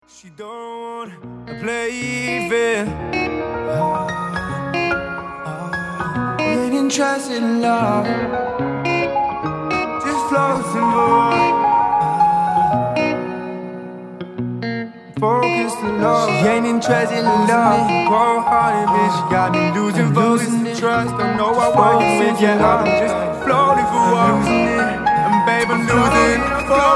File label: singer songwriter piano